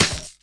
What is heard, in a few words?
SD HOU, Dance Mega Drums; Best Service